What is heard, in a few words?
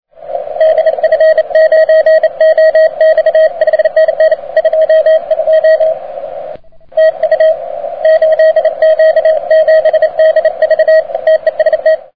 80m, beverage 60°; HAM-radio; 8Q7DV